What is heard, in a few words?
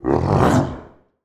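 Canine Aggressive Fast Roar, monster sounds; The Decline Of Warriors; Monster Sounds Volume II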